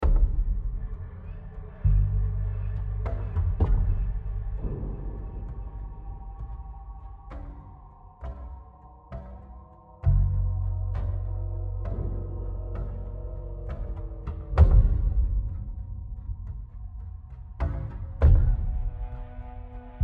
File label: Tension Match Over; the last of us